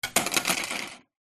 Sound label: clothes hanger metal wire drop wooden floor; household